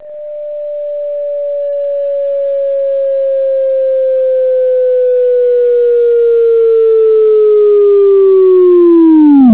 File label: pendulum